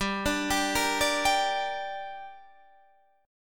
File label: G Minor G down Guitar Half Step Down, chord